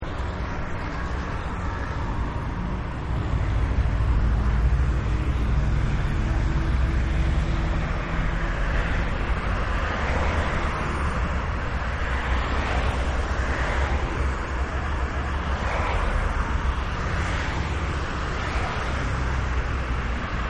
Traffic Motorway Loop; Traffic and Cars; Sound Effects:Backgrounds

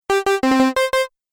Game Section Complete, video game

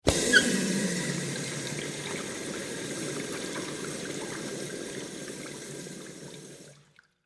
Water Toilet Urinal Flush Public Bathroom

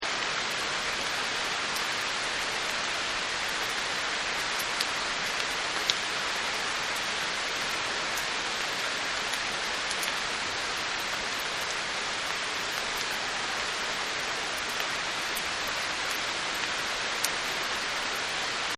Rain Med; Weather

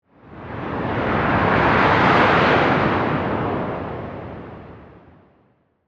Ghost Pass By, Spiritual, Movement, Whoosh; Horror; Ghost Sound Effects

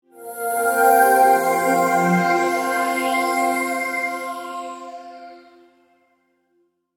Multimedia Chime, Technology, Multimedia, Chime; BlastWave

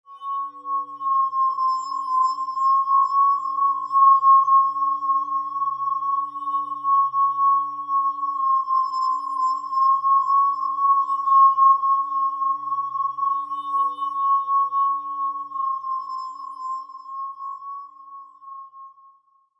Scary movie scene, thriller-horror ambience sound; Scary Soundscape

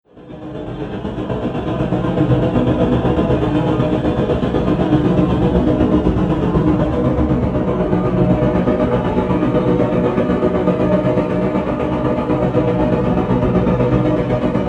Sci-fi Horror Vibrating Drone; Andrew Fleming

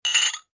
household glasses tumblers x2 clink; Drinking Glasses (X2) Clink Together; Clinks; Objects; McKinney Sound

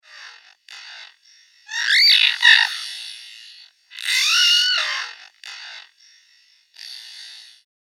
Alien Being Tortured; Alien Sound Effects; science fiction sound effect

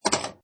Pinball Sound Bumper Ka Klunk; Video Games

